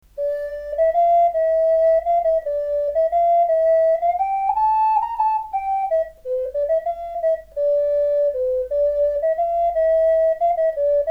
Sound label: Gemshorn